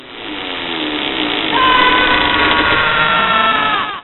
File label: on the rack